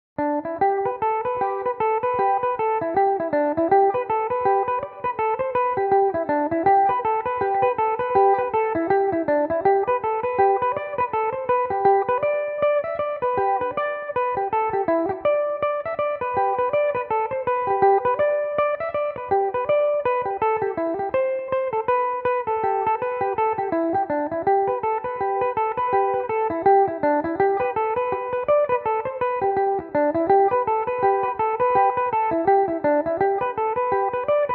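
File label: G major belmuse, irish mandolin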